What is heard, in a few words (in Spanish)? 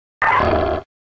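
"Grito de Lucario", Pokémon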